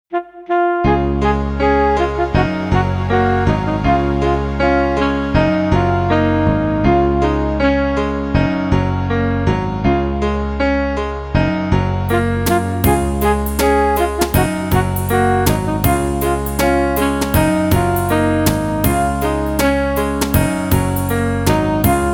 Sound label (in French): VERSION KARAOKE